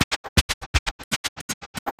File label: Gapped, Drums Beats